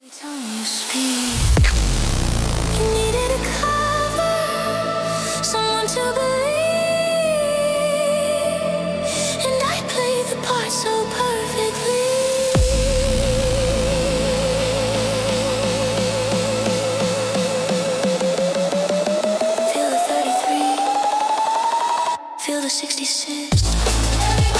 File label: 33 hz 66hz ritual